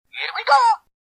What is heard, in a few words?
Wiimote Sound; Mario